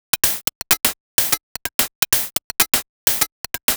Hihat 127bpm, House Drumloops; 90 s Sample CDs; Zero-G House Fabrik Drumloops; ZG Drumloops

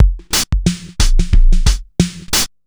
Street Tip, R&B Drums

